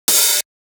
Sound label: Alternate Drum Loop 125 BPM Open Hat; Drum Loops; Analog; Cymatics - ANALOG EVOLUTION Beta